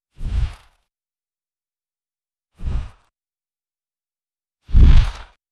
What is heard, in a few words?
soft dog grunt